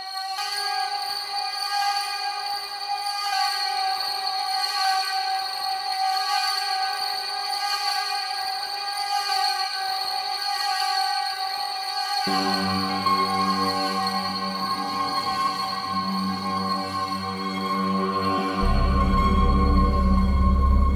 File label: detuned piano